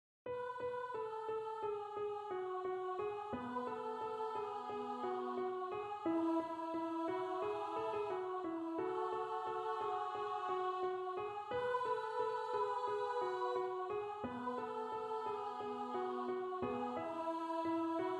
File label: two part treble